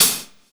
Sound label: Hi Hat; Sonic Foundry (Sony Creative Software); Drums & Drones; Construction Kits; Modulate Kit